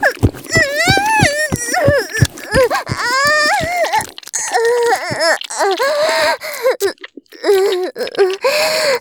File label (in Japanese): 絶頂 中出し; 騎乗位